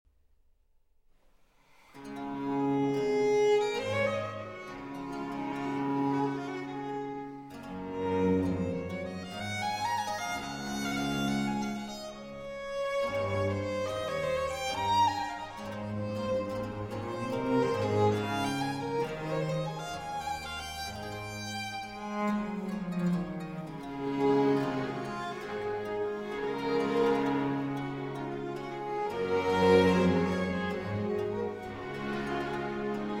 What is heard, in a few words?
Suite in D Major For Strings V. Air